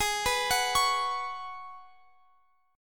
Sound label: G sharp Minor 11th, Guitar Open F; arpeggiated; x,x,20,18,21,20; G#m11